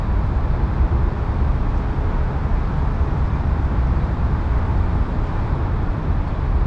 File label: amb bank, ambient atmosphere; cstrike